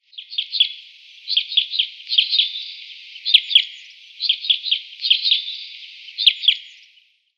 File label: Common Crossbill song